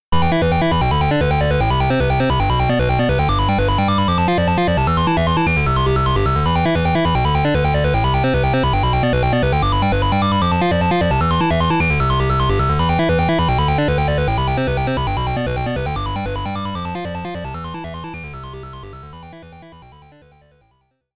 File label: Coin Get